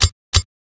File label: Corps run; Seer; GamigoNA